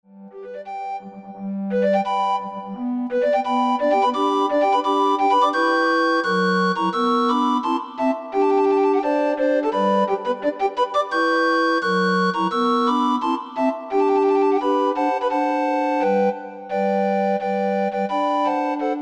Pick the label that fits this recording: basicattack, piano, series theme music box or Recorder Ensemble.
Recorder Ensemble